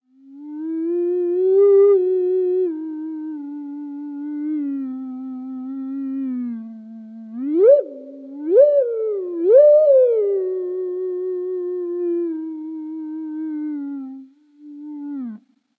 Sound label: Theremin